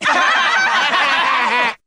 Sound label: laughter group; ooh ahh